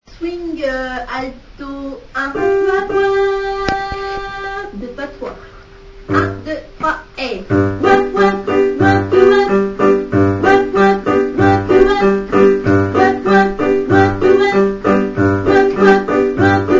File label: Swing Alto